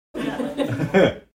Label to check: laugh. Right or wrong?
Right